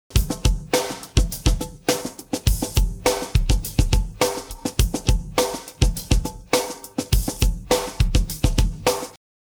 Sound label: pattern, drums